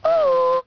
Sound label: F happy; People